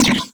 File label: Multimedia Internet CD-Rom Flash Buttons Hard